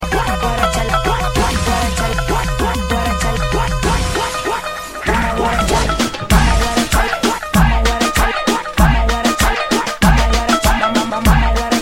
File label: reggae